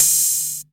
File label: juicy open hi hat sample c key, Royality free open hi hat sound tuned to the C note